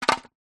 vehicles bicycle helmet plastic set down drop ground